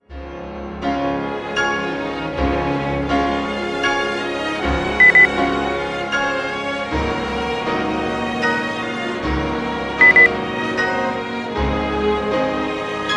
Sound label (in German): klassik klavierkonzert